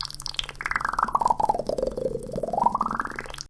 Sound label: bubble